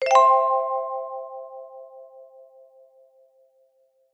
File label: success